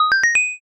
asia xylo

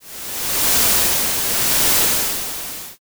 blizzard

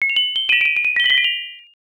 kagura suzu

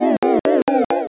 laugh crowd

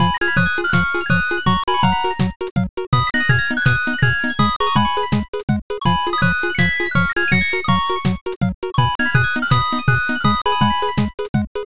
bgm bright

